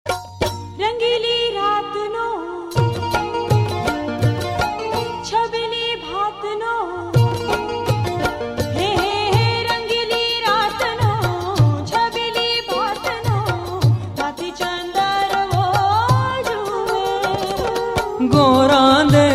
garba

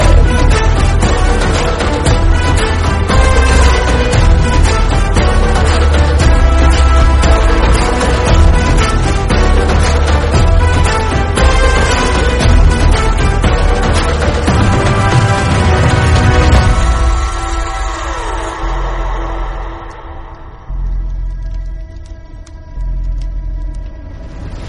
car entry bgm theme; kgf 2

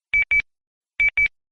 piano beep sound notification

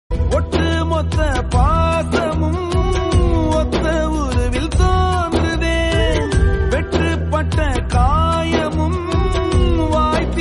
tamil sad song; annadurai tamil movie

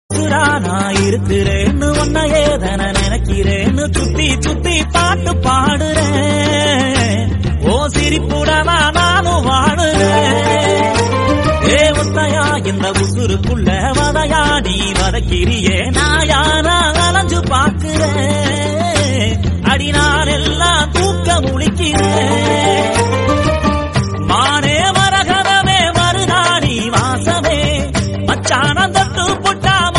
telugu song

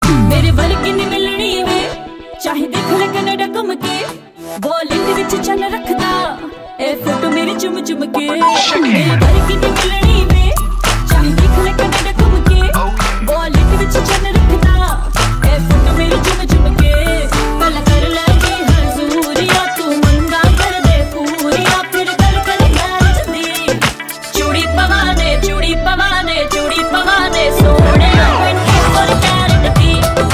Ringtone

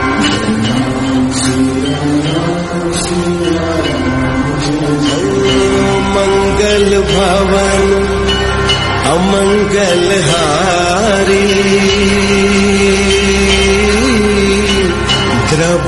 bhakti devotional hindi

